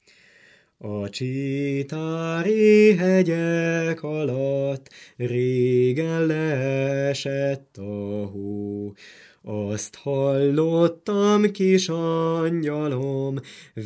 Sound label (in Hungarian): nepdal; a csitari hegyek alatt